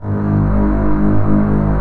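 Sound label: bass G#0; Digital Sound Factory Virtuoso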